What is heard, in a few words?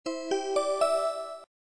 Set Power Mode; LU9000 Arena; LG